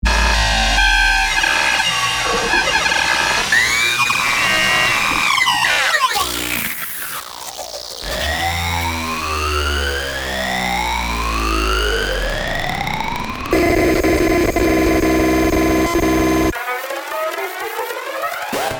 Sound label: Electromagnetic SFX